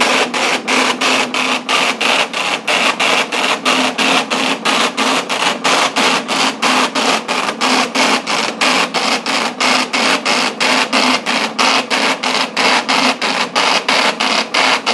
index basic v4 braille printer longer with voice and chatter